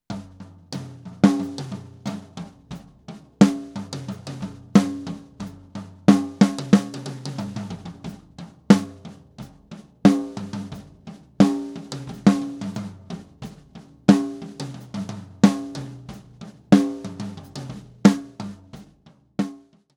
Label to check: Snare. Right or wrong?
Right